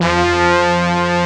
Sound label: S BRASS F2-L, FUNK